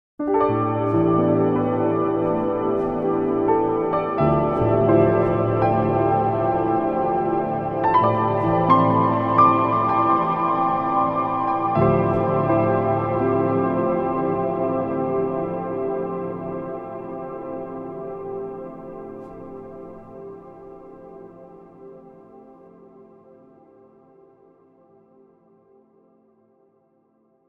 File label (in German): Klavier; Valhalla Supermassive